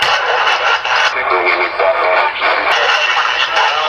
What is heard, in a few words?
spirit box